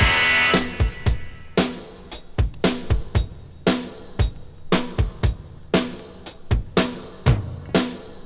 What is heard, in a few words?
116 bpm high quality drum groove b key